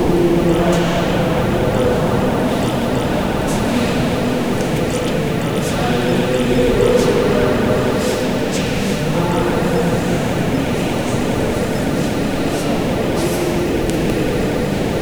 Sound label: a big crowd of people, cathedral